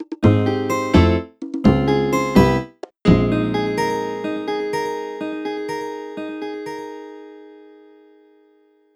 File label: Startup; Nintendo Countdown Calendar - Startup; Nintendo DSi